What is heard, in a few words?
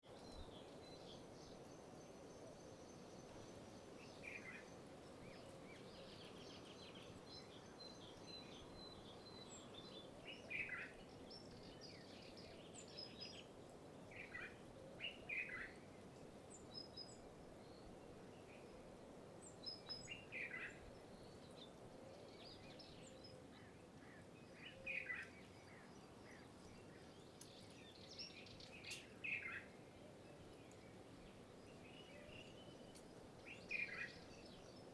country birds pigeon flies; atmosphere